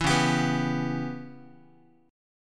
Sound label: C sharp Minor 7th Flat 5th C sharp Guitar; C#m7b5 strummed; 7,9,10,9,x,x; JGuitar